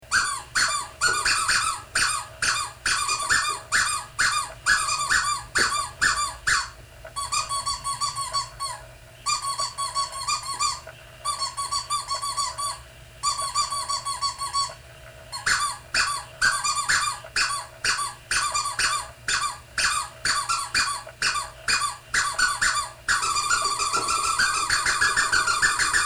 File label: Squeaky Toy Etude